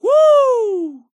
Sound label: woo